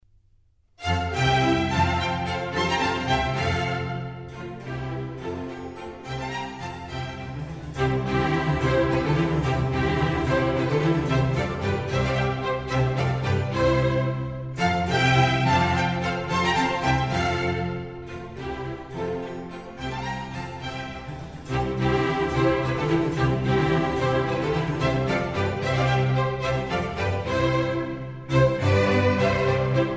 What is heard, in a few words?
Concerto for Strings in F major; RV136; Antonio Vivaldi